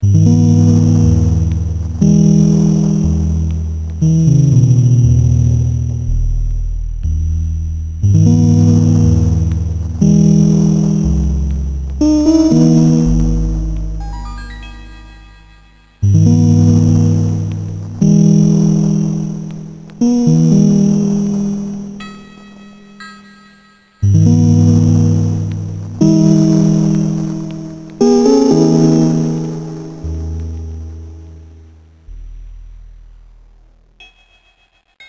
forest restaurant

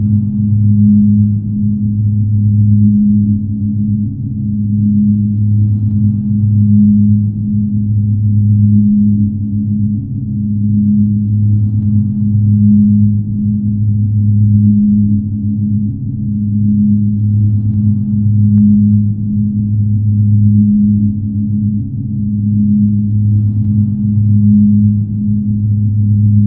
Ambiance

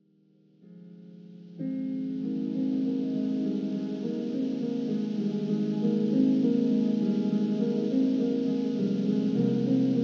Piano

